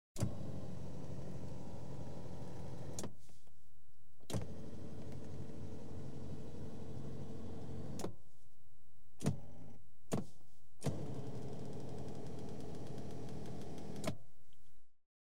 rolls int elecrtrical seat adjustment; Transport; BBC Sound Effects Library

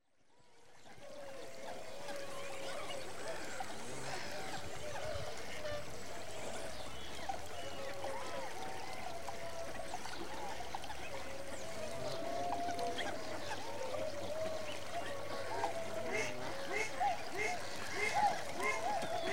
Winter migrant waterfowl; Animals & Birds; The BBC Sound Effects Library

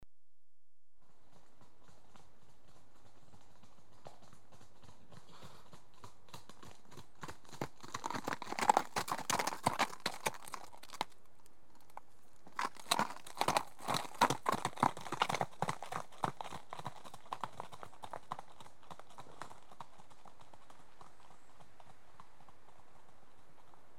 two horses at trot on gravelly track, r to l; BBC Sound Effects Library

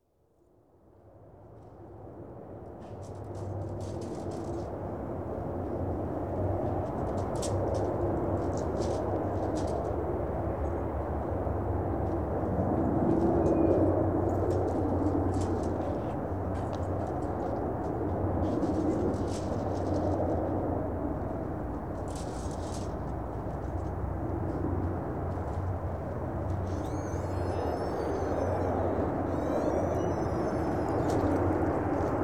Dash 7 Aircraft (De Havilland; England; The BBC Sound Effects Library

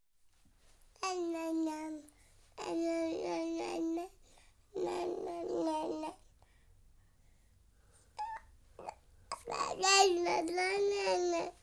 9 month old girl chattering, Babies; The BBC Sound Effects Library

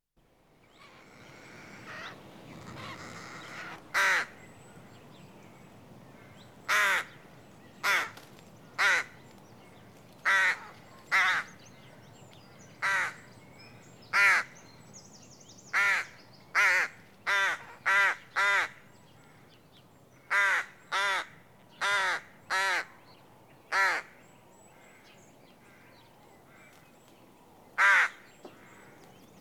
Rook (Corvus Frugilegus) Adult Ca; Birds; The BBC Sound Effects Library

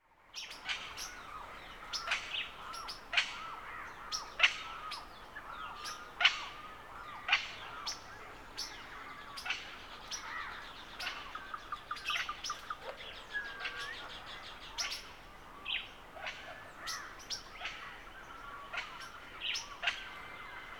Morning Birdsong By Pond, Pantana; Rural South America; The BBC Sound Effects Library

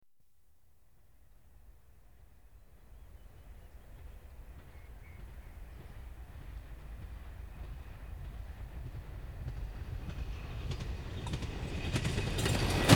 Trains Passing Diesel railcar; The BBC Sound Effects Library

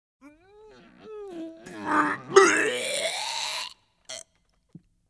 Throwing up; Comedy, Fantasy and Humor

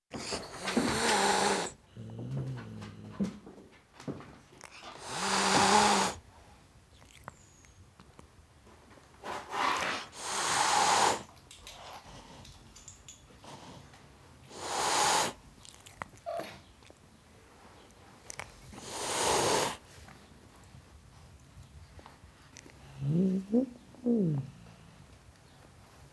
Tom Cat Hissing At Dog; Cats; BBC Sound Effects Library

